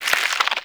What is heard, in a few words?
Symbiotic Link Loop End; Warframe; Infestation